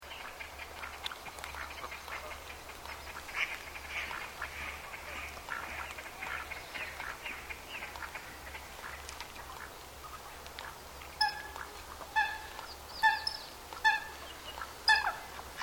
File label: Tranquil Lake, Gentle sounds of water birds on a calm lake at dusk.; Lakes; Nature